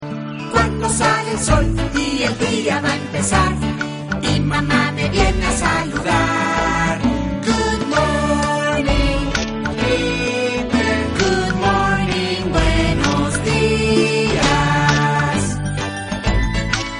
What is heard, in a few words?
pipe